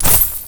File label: Get Coin Sound For Item Selling